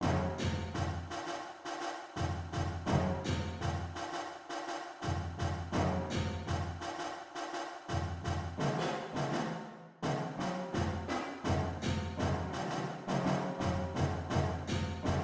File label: 94 bpm